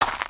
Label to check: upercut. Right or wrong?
Wrong